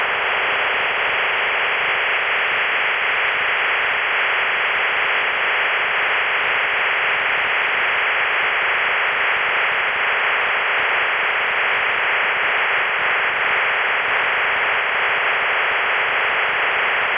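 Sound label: OFDM 112 continuous; Russian 112-tone OFDM waveform with continuous traffic; RUSSIAN HDR MODEM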